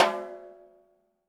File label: DW Green Maple Timbali; Sonic Foundry (Sony Creative Software); Vital Drums